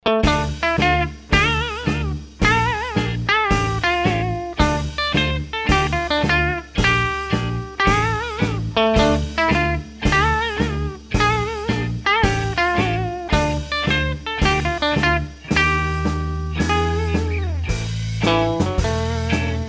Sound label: Blues